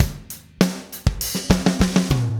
100 Groovin' Drums; Pop Rock; Moderate Tempo; Pro Tools Essential Loops; Digidesign

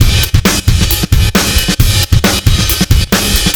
135 Garage Heavy Drum Loop; Dance DJ; Drums; Garage Breaks; Pro Tools Essential Loops; Digidesign

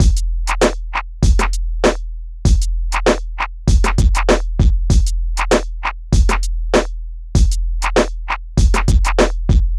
Hip Hop Beat, Drums; Drumbeats; Digidesign; Pro Tools; Pro Tools Essential Loops

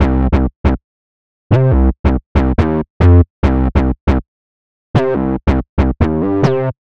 140 House Bass Cmin; Digidesign; Pro Tools; Pro Tools Essential Loops; Dance DJ

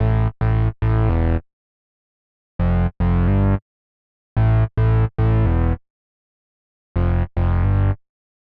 Latin HipHop Synthbass Amin; Digidesign; Pro Tools Essential Loops

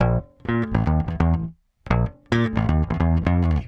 130 Garage Bass Bbmaj; Dance DJ; Digidesign; Pro Tools; Pro Tools Essential Loops

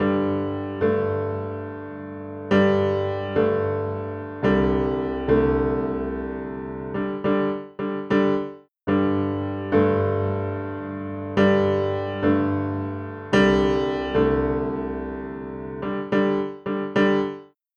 Country Piano, Intro; Digidesign; Pro Tools Essential Loops

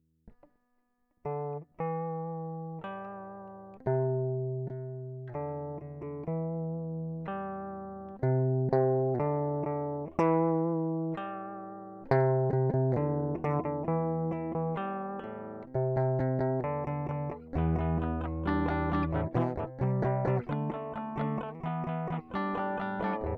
Rhythm Guitar

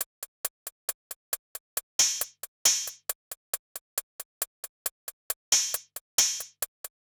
136 HipHop Hats; Drums; Pro Tools Essential Loops; Digidesign

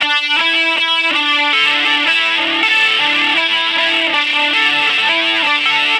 160 Pop Guitar Lick Bb maj, Electric; Pop Rock; Electric 100-160 bpm; Pro Tools Essential Loops; Digidesign

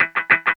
E-Coast HipHop Chaka Guitar E; Digidesign; Pro Tools; Pro Tools Essential Demos; Essential Loop Demos; Demo Session - Hip Hop

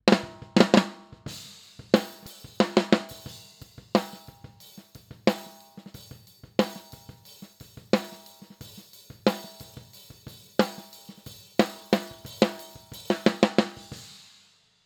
Snare; Pro Tools Essential Demos

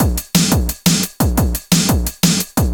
175 D&B Drums

